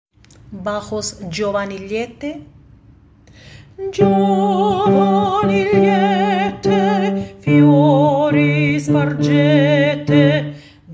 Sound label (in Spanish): bajos